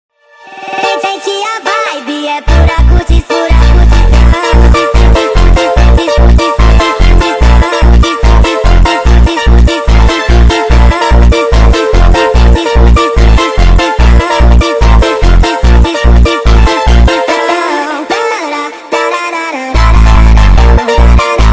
MONTAGEM Sped Up

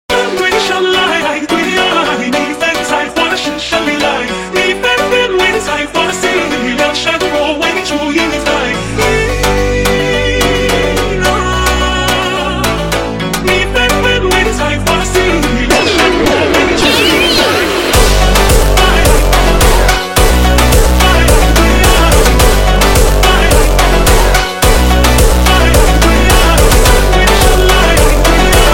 Echoing Jumpstyle super Slowed

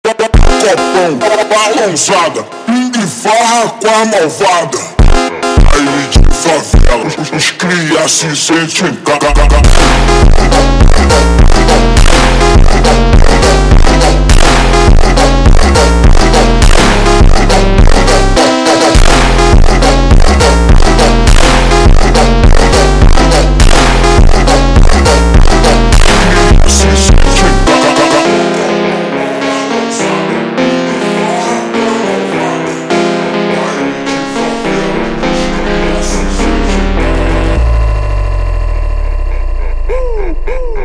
Funk super Slowed

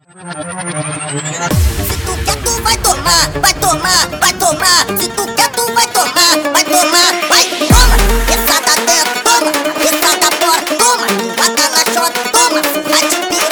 Arabic Funk sped Up

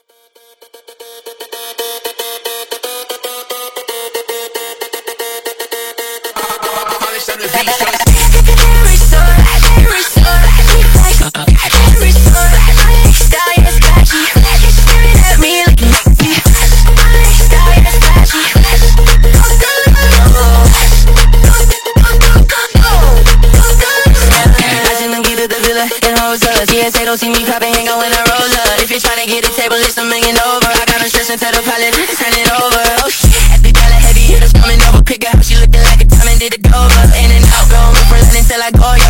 Sped Up, funk

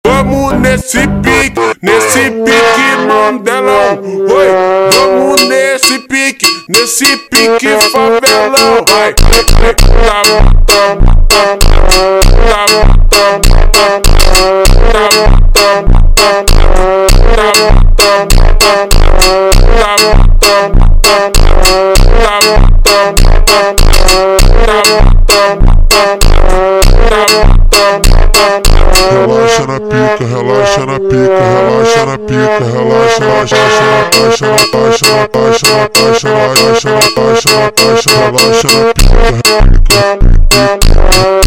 ultra Slowed

